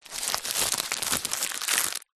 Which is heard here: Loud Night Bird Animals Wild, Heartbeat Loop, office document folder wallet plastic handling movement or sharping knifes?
office document folder wallet plastic handling movement